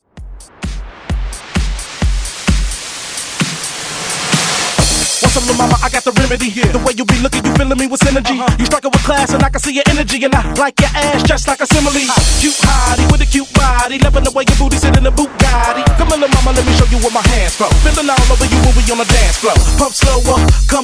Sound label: Slow Jam 130Bpm; DJDOLLS; Mixtape; ReDrum